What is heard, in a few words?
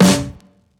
drumbreak snare; Hiphop